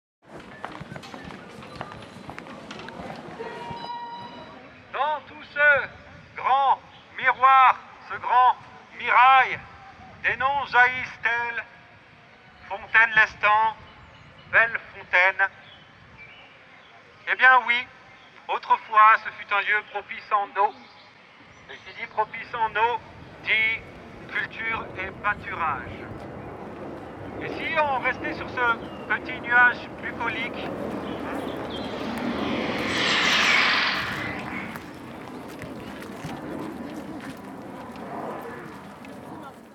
nuage bucolique randonnee sonore; court circuit 2024

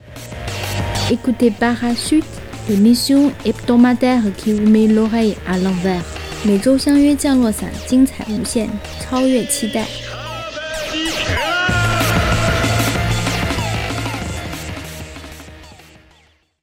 jingle parachute; la surprise

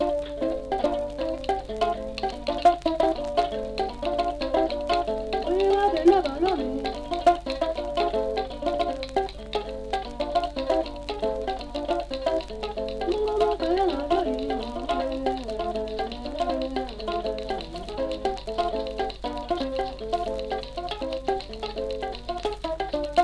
chant et harpe cithare; Dongbo So Chant De Societe Secrete

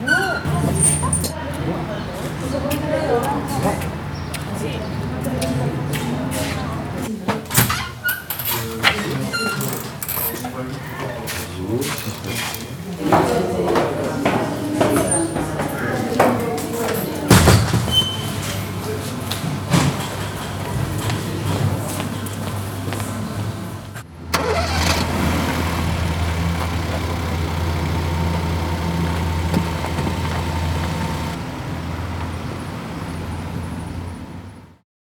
carte postale sonore

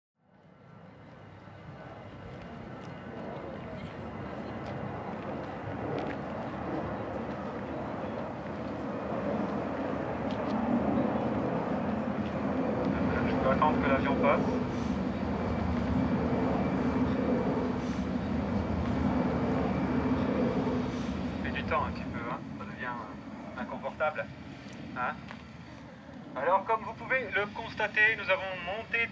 chateau miroir randonnee sonore; court circuit 2024

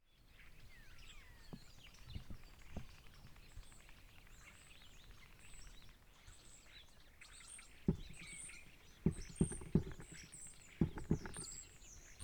carte postale sonore - Infernal Forrest

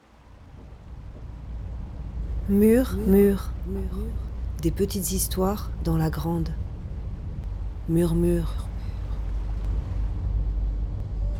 jingle murmure s

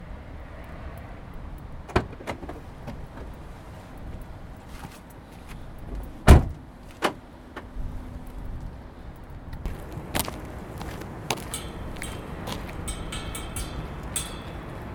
Paysage Sonore

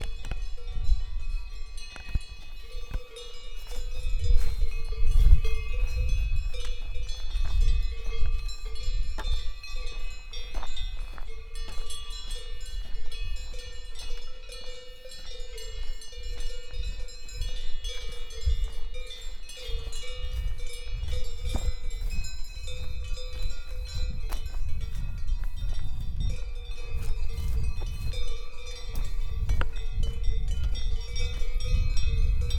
Polyphonie clochettes; MEUH

